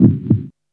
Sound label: HEARTBEAT